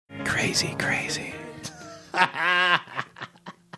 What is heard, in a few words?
saying crazy crazy laughing